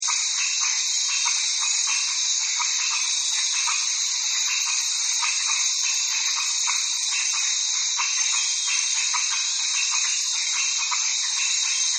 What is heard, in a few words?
Jungle Life; Nature Scenes; Forests and Jungles; Partners In Rhyme